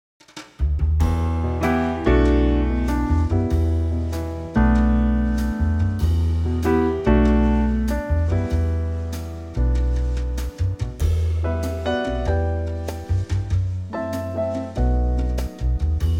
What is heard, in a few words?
Em trio swing